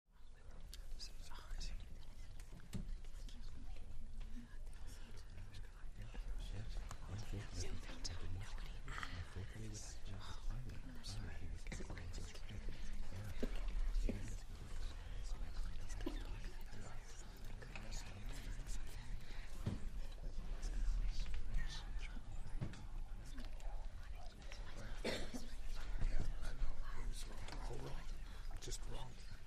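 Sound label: Crowd 12 Presence Light, small interior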